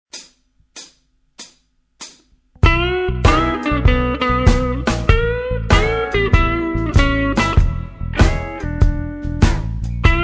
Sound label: blues jazz